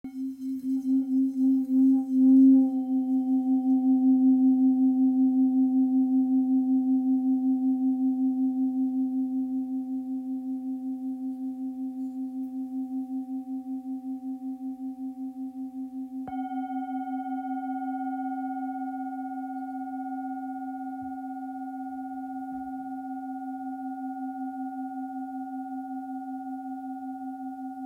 Bol full moon do 840g

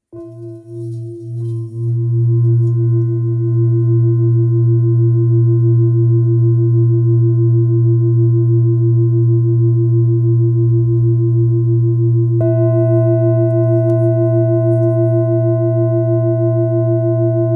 Bol Bengale 2-2.1kg la diese

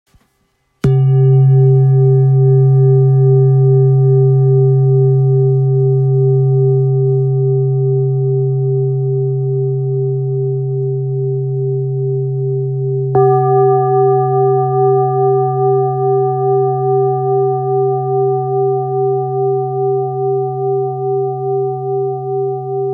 Bol therapeute do

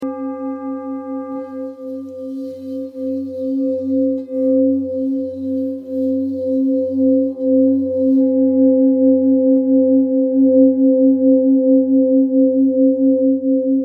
Bol bengale do diese

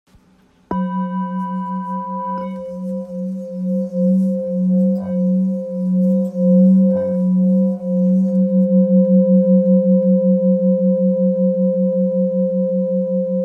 Bol ancien fa diese

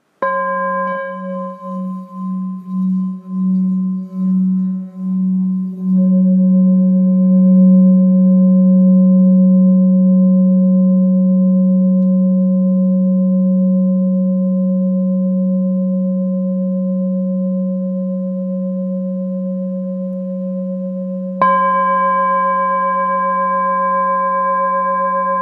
Bol 1,4-1,5kg Fa dièze

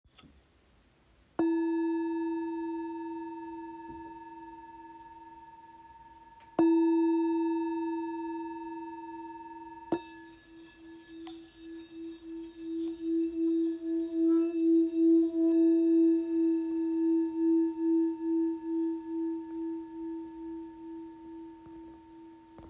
Bol chantant ancien de l'Orissa Note Mi; Présentation des bols tibétains de l'Orissa, bols anciens en 7 métaux

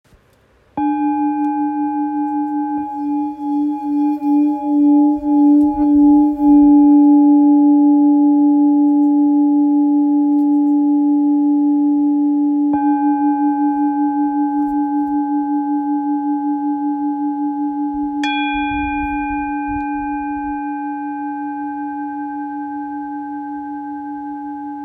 Bol ancien do diese 1.06kg

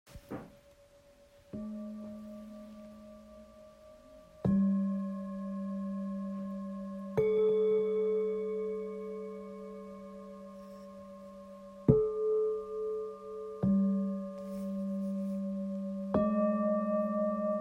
Set 3 bols thick fullmoon bengale constallation

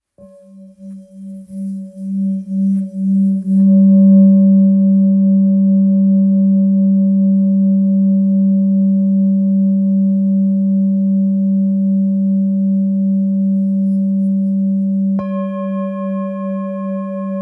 Bol full moon fa diese 875g; Bol Chantant Full Moon

